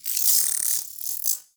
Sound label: Venus Robo Fish Small Caught; Warframe